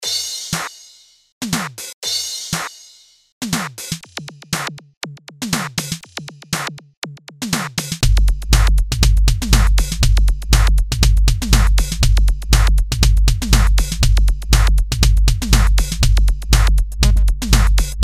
moog; akai xr10

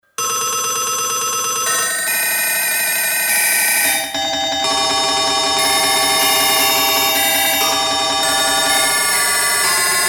phone, roland mt 32

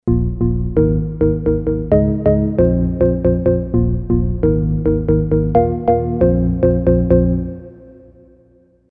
korg m1r synth

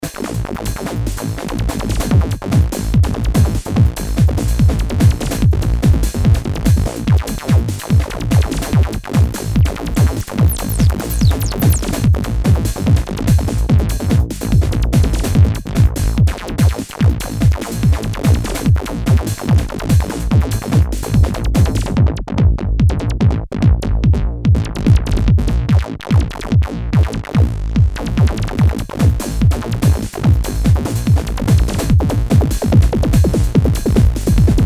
seq, korg ms20 synth

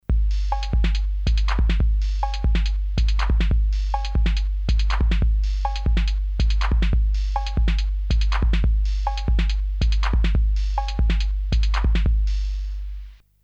808 filtered; commodore amiga

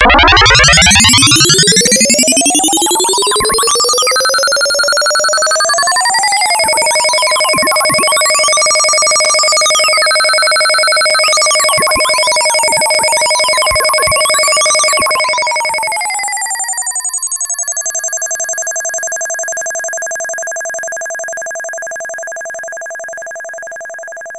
fx freq, yamaha tx81z

